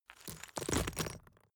stone drop small stones

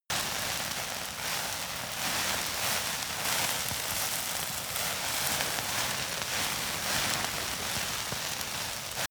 fire object small burn

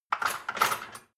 dishwasher cutlery basket rattle

